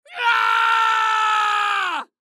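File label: male scream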